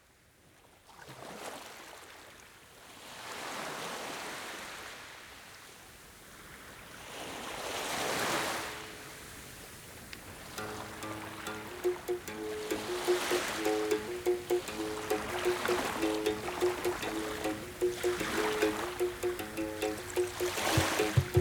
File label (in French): Ambiance Son Du Bobre